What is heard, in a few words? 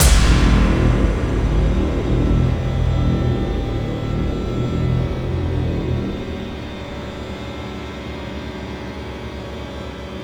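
Grab Loop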